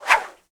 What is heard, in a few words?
Whoosh, Arrow, Feather, Low; Universal SFX